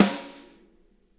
SNARE, Interactive Drum Kits; Sonic Reality

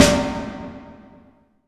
IMP SNR DRUM, Drum, Cinematic Impact

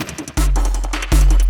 LOOP, Drum & Bass, 160 BPM

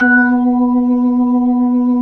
B-3, Jazz

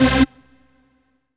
RAVE, RAVESYN

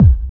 DR. LOOP, DRUMS; X-Static Goldmine 3

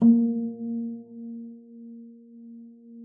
FLAG A3, harp; Xsample VOL-8; HARP+FX

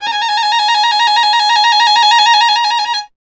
STR VLN, Solo Instruments; Miroslav Vitous

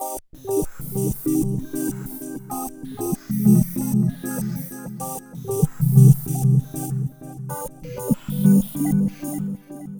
Whisper Echos; Dr. Fink's Funk Factory

